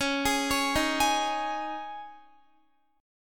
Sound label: Db Suspended 2nd, Guitar; arpeggiated; x,16,18,18,15,15; 4E,4A,5D,5G,5C,6F; Dbsus2